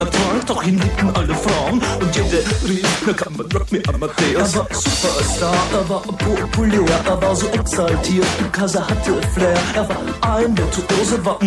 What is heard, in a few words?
australian ringtone